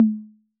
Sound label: Conga Low B 808, Clean Digital